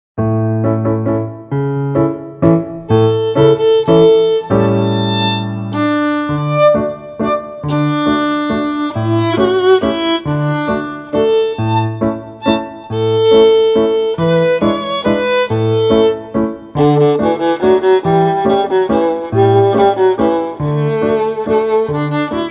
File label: mazurka